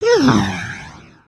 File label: Idle, creatures organic